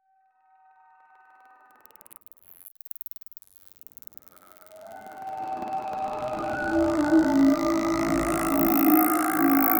ambient trasition fx that, psychedelic

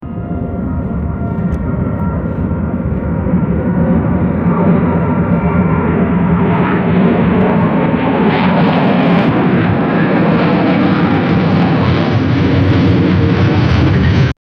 an airplane in midair suf

